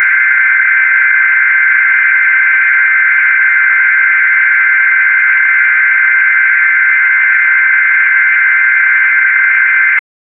alarm pesawat darurat, aviation

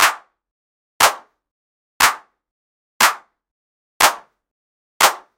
tepun tangan, applause

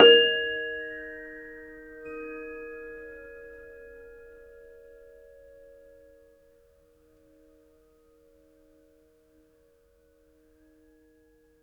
suara angin malam menderu; indoors

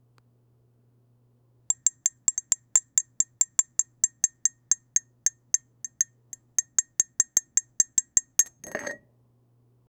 suara mengaduk kopi dalam, mug

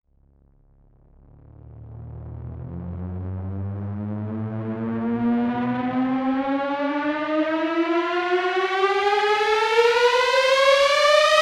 musik sting nada tajam

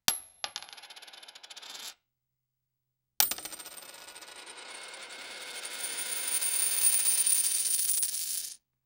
transaksi coin yg ban; coins

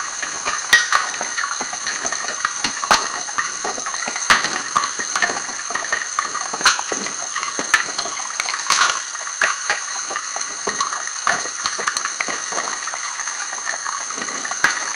gelombang di lautan lepas; ambient

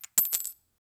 transaksi tumpukan co; coins

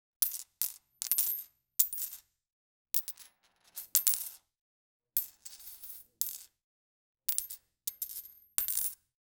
transaksi tumpukan co; coins

